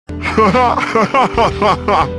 laugh

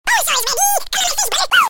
bonesaw

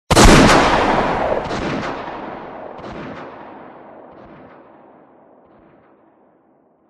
gun

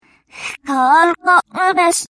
clock, reverse

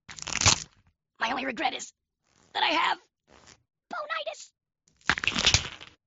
wiggles

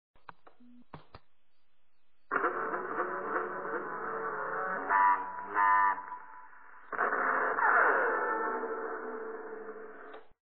meep meep; vapesquared

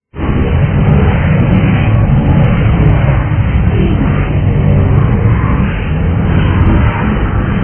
crowd; vapesquared

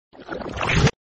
splat, reverse

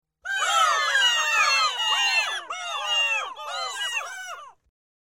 boo

